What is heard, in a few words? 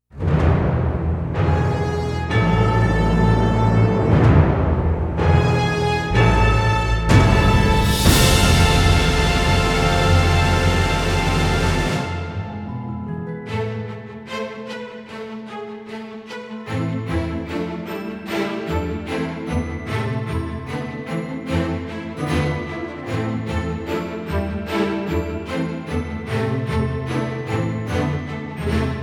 ode to joy non choir no sleigh bells